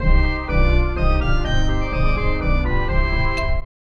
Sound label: Lose a Life; Yogi Bear; SNES